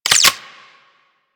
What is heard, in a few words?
Spectra Reload, weapons